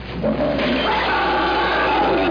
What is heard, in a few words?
creature empty trash